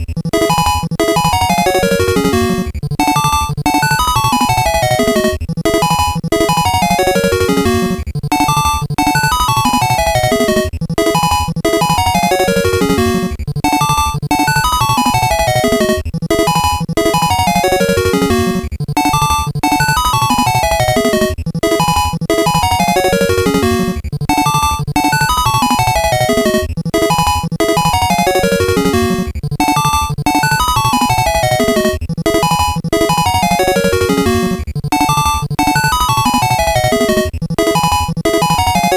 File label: Bonus Game, Normal Game (Retro); bomberman party edition